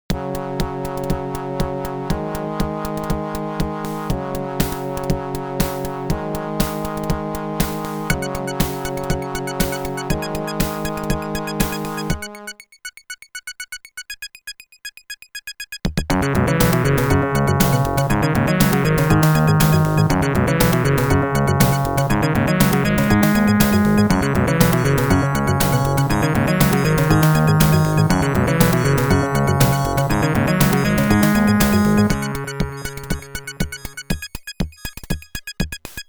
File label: Bass; Another Bass S-MIX; Stage 5 BGM 1